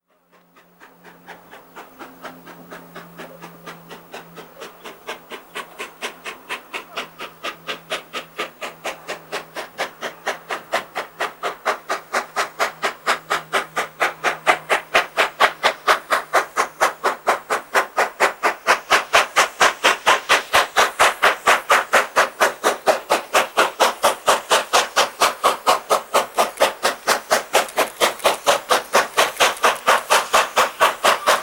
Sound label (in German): dampflok sound china